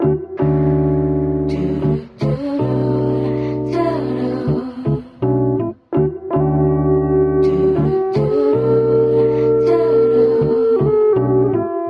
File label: keys and voice, Phonky loops